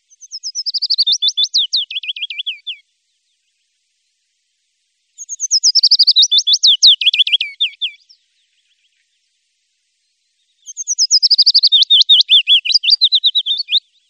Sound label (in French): Pouillot fitis pinson arbres